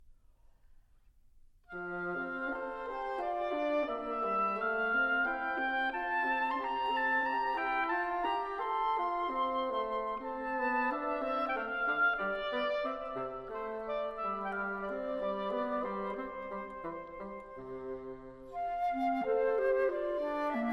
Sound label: Quintet, Andante grazioso, Woodwind Quintet